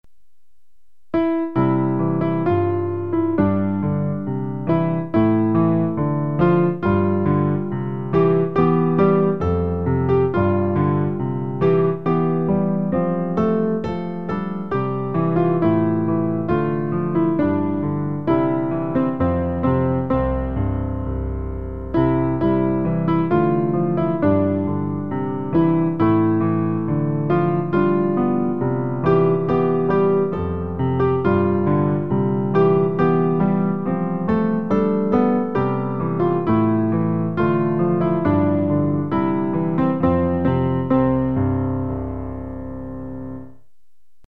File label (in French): piano; Hier, aujourd’hui, demain; Pour accompagner le recueil Louez Dieu.